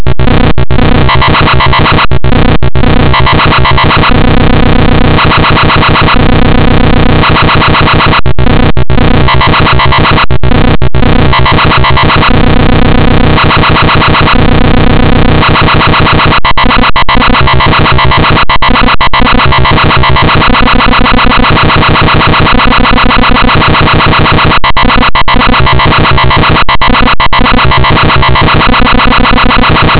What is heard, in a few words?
bytebeat